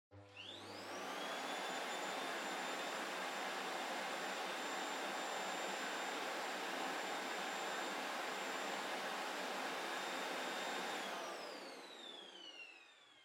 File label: Vacuum Cleaner Far Vacuum Mid Long